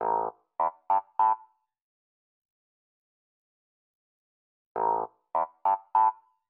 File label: Electric Piano, Loops, Keys